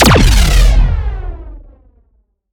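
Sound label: Shot Large Blaster Distorted Cruncher Boom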